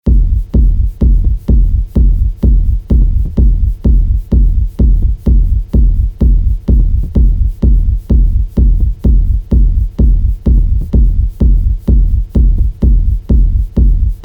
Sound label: Sirena